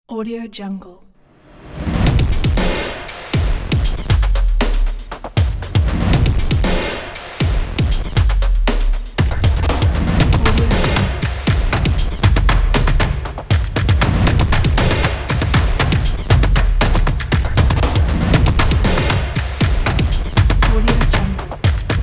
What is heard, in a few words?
rock drums; andromedasound